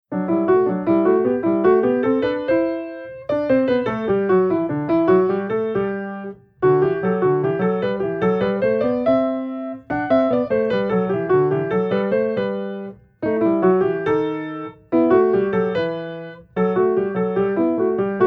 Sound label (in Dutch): Oefening met een combinatie, piano oefeningen; Oefening met een combinatie aan b